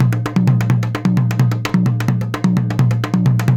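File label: junjunba groove; Best Service; World Colours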